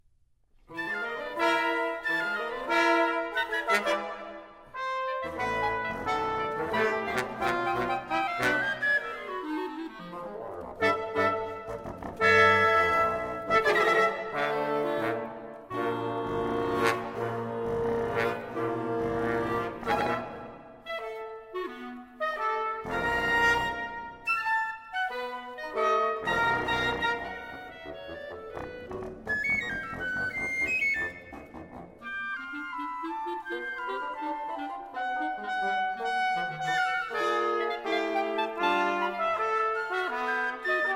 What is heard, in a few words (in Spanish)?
Pieza Para Doce Instrumentos